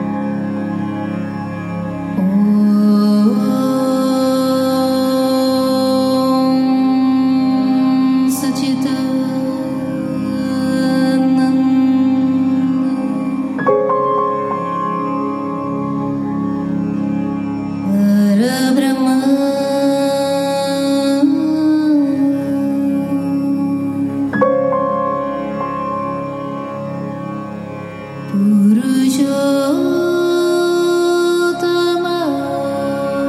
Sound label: Moola Mantra